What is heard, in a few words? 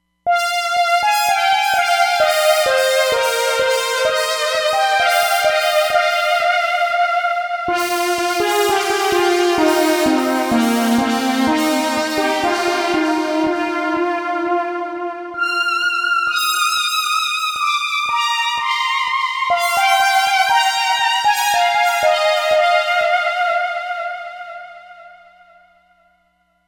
Lethargy, Roland Fantom XR